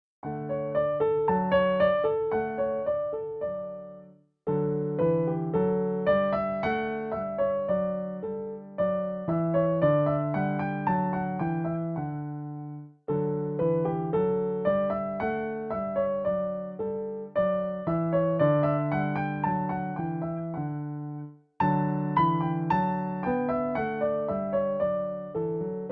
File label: Musette